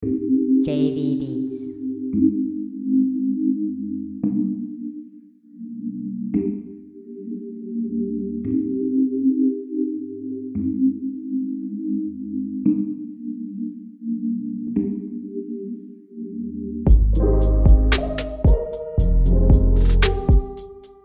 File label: slo-fi groove